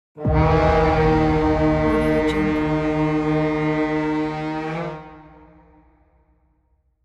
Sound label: dark war horn